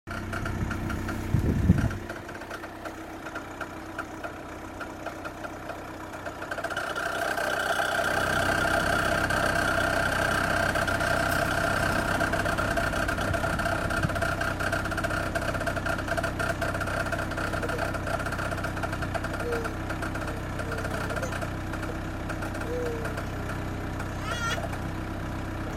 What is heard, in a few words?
outlander rattle; mitsubishi